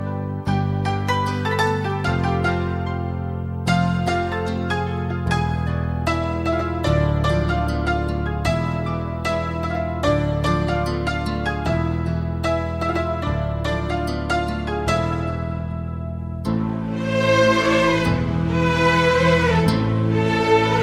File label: style instrumental hit ringtone